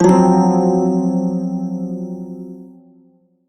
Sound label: Shawzin Hirajoshi Chord; Warframe; Prime Shawzin